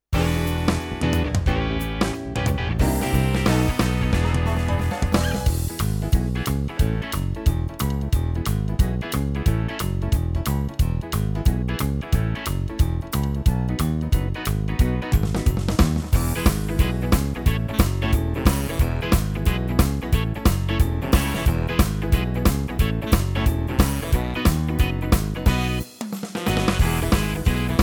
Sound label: Yamaha S970 Style